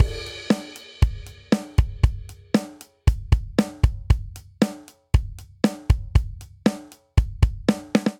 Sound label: Straight Rock Verse 117 bpm; 4 4; MIDI Clips; Ableton; Factory Packs; Drum Booth